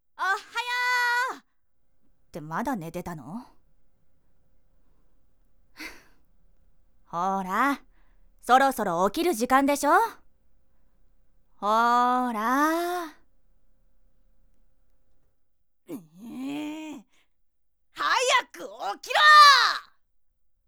目覚まし

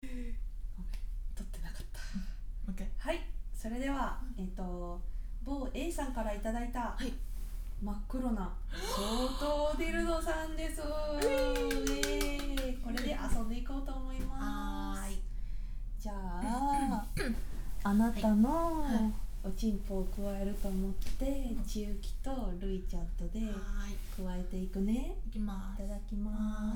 双頭ディルド バイノーラル

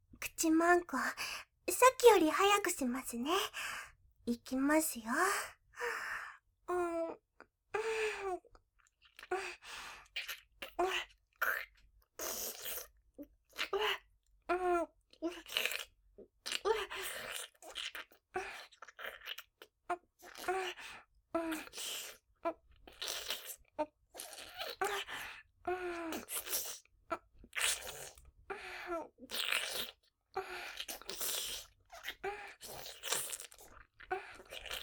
中速ピストン; ダミーヘッドバイノーラル; VOICE LOVER

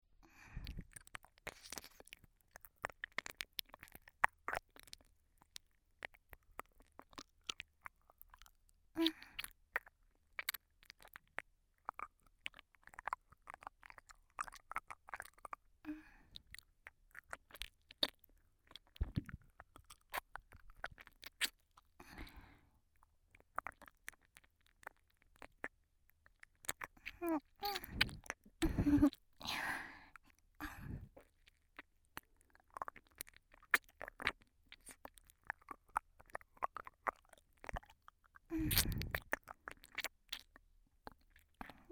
台詞無し版 右耳; 衣智子ちゃんからの今日のご褒美

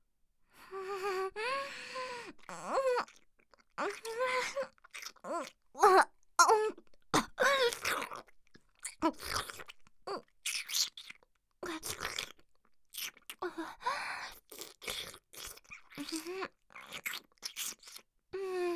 ディープキス; 本番; 操られ

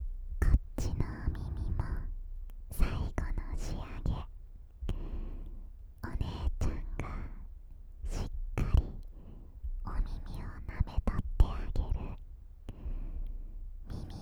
お姉ちゃんのとろとろ耳舐め掃除（左耳）

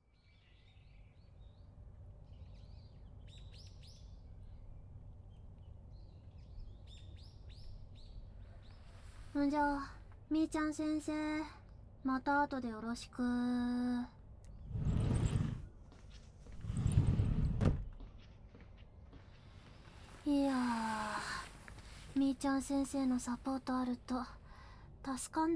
休憩耳かきサービス, ASMR

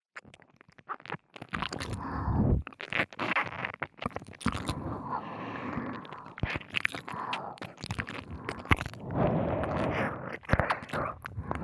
両耳舐め, ASMR; しゅきしゅき

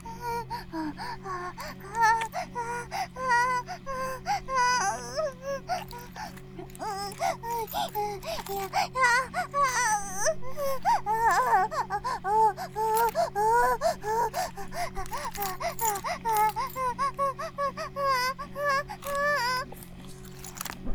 バイブ放置, ASMR

